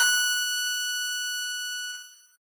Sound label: piano; pianojs